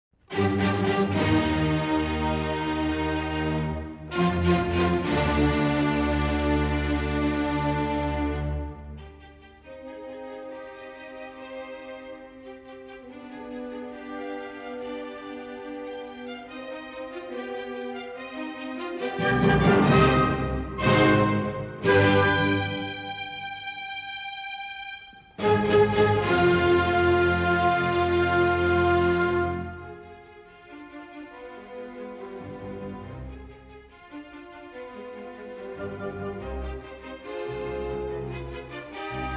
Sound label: symphony no. 5 in c minor second movement